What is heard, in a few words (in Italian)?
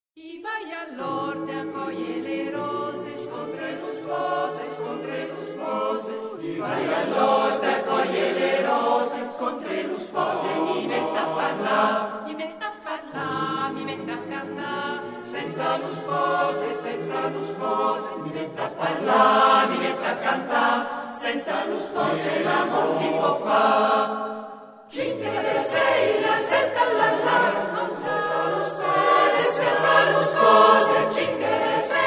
Coro; Coro Del Lunedi